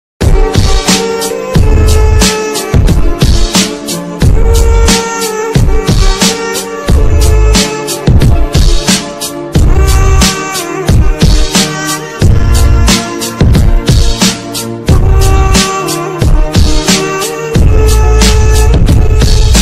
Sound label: new english ringtone; tik tok